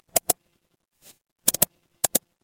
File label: office mouse click